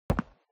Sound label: FOLEY STEP Boots Concrete Single Left; Poppy Playtime